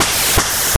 8bit Retro Train Steam; smashy road android gamerip 2013

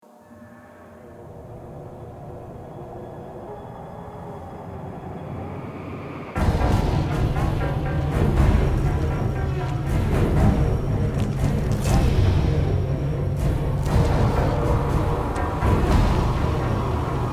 music Blasted Street Combat

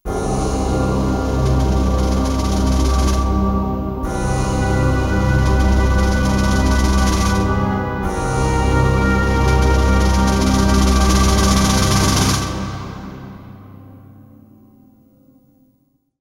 loss; planetside

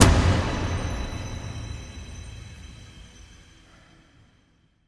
Impact; song of iron

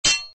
sword sound; gamerip; Arc of Templar

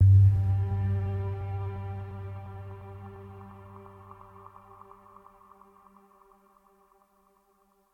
Jingles

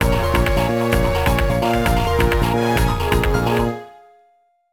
BGM STAGE BATTLE; Phantasy Star Zero

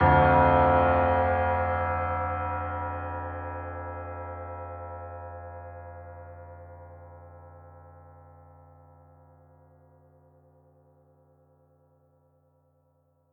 piano theme modular hypothesis; The Detail ios linux macos windows gamerip 2014

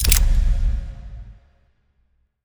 Inventory New Item Accept; Panorama

